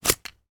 household audio cassette tape take out of case

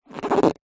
rubber squeak; zapsplat

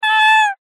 animals cat kitten meow; zapsplat

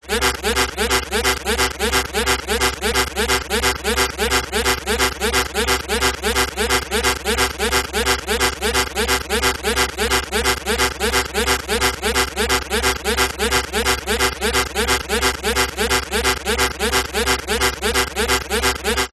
science fiction alarm warning buzzing fast

